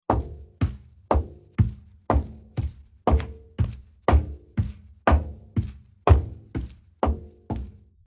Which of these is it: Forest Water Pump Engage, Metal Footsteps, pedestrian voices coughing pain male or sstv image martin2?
Metal Footsteps